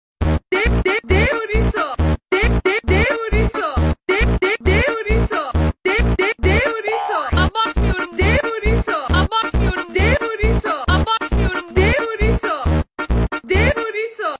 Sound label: funk